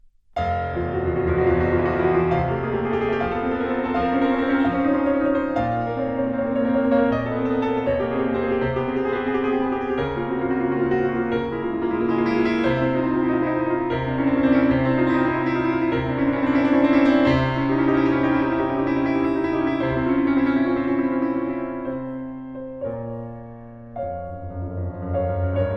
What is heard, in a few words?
Piano Sonata Moderato, poco andante; Martinů